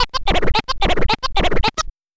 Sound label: Scratch